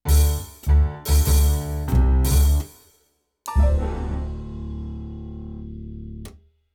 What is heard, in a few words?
Dramatic Dancing w Bass